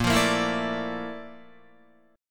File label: Bb Major Sharp 11th Bb down Guitar, chord; strummed; JGuitar